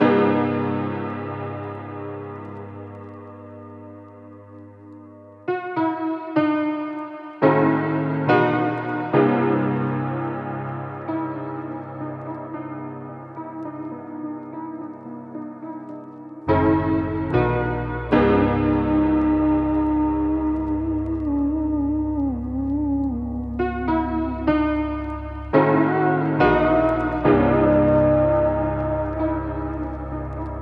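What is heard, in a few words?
Piano, 106BPM, Eb minor, Acoustic, Hard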